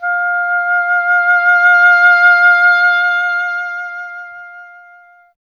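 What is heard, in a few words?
oboe, wind; Miroslav Vitous - Solo Instruments VOL-2; Solo lnst. II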